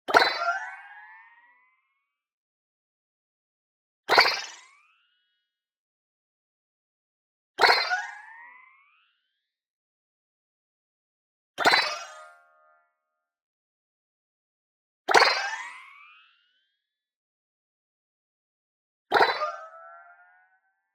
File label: BLING Filtered Metal Pitch Delay; boom library; Anime Essentials